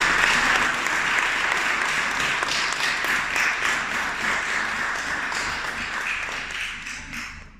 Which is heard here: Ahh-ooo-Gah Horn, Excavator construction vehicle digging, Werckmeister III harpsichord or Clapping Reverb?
Clapping Reverb